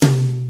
acoustic tom drum single hit c key, Royality free tom drum tuned to the C note.